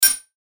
chime metallic bright; Chimes (Sound Effects:Multimedia)

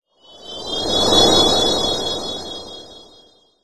Whoosh Windy Shimmer Warble; Blastwave

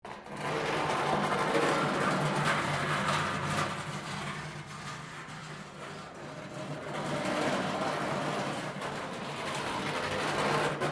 metal rubbish bin drag concrete; Materials

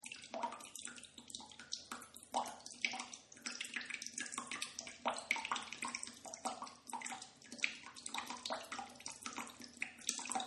Bathtub Trickle Loop; Bathroom; Household; Partners In Rhyme

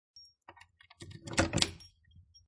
Insert Key And Unlock Door; Lock Sound Effects; Locks (Sound Effects:Objects); Lynne Music

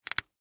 Rope Cut Twine, Industry, Rope, Cut, Twine; Hand Tool; Tools; BlastWave

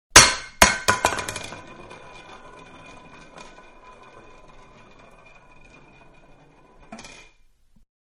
Large Can Fall On Wood; Can Medium-Large-Falling Onto Wood; Metal; Materials; Manhattan Production Music

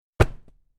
Cloth Character Movement Foley Whoosh; Arm or leg movement with cloth foley.; Miscellaneous Human Sound Effects

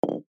Bowling Ball Set Down Cement; Sports

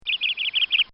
Canary Trills; Birds; Animals; Bobby Cole; Bird Sound Effects

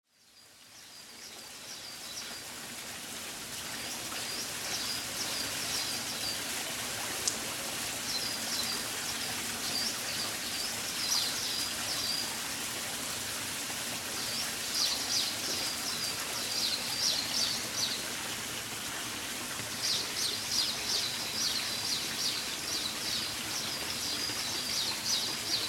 Water Garden Birds - Morning birds in a garden; several small water features; Light water splashing; Bird Sound Effects

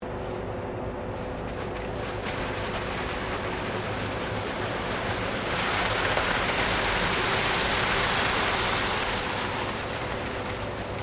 Construction Cement Truck Pouring Short; Industrial cement pump operating

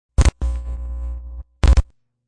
Static Noise

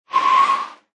Car Or Motorcycle Brake, Tyre Screech

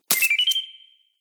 Rpg App Game Loot Win, Magic Sound Effects

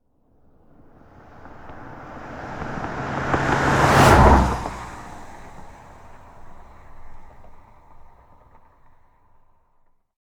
Vehicle Auto Pass By Fast Automobile Car; Cars; Vehicles

